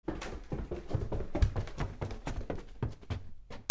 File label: FOOTFALL